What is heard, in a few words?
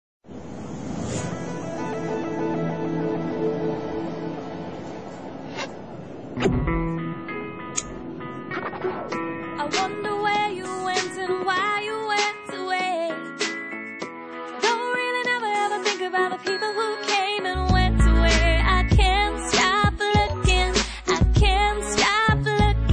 Latest Sony Ericson